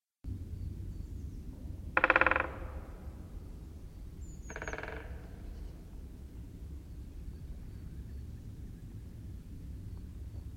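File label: great spotted woodpecker voice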